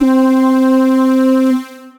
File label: Coil Plucks, Synth Keys; Ableton; Creative Extensions; Racks